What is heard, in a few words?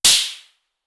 slap